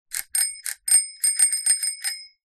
transport bicycle bell ring

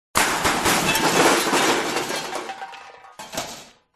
crashes junk various; Audio Mangler

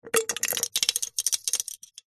can soda empty ring pull shake inside; foley; zapsplat

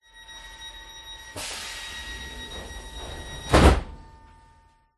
train london inside door closing; london music mixing

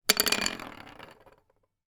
office pencil put down on table and roll long

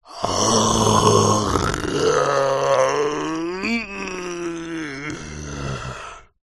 horror zombie male groan

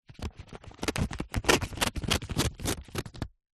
paper sheet cut with serrated knife; foley

